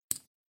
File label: chip pickup chip pile